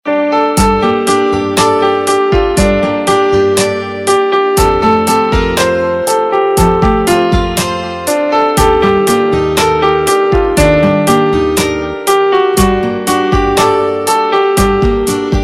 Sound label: Instrumental Ringtone; Bollywood Hits (2014)